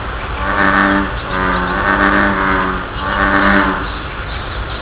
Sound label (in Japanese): ushigaeru; KitamotoShizenKouen